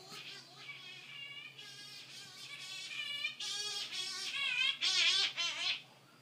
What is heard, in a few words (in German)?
Gelbschnabelsturmtaucher